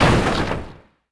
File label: impact crate dirt debris; impacts explos